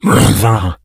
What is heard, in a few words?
become alert, infected; npc; Left 4 Dead 2; Source Engine